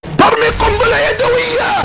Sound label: THROWING A HAND GRENADE